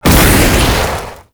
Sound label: Spike Orb Fire Impact; Warframe; Tau; Circles Of Hell